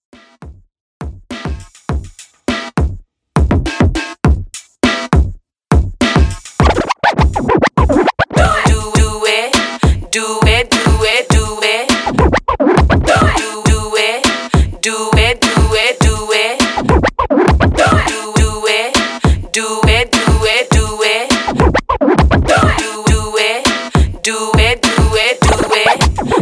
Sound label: ReDrum 102Bpm